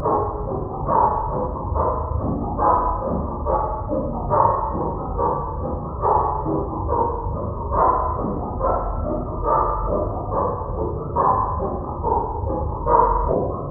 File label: loop; woofenstein; Undertale